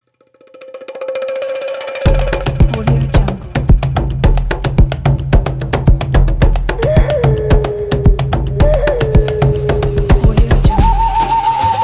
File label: tribal forest festival